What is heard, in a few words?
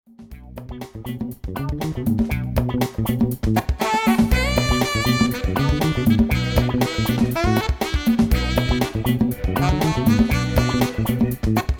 SAXWORKS funk